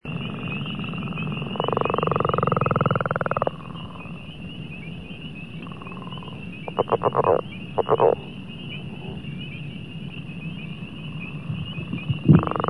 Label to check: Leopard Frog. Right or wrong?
Right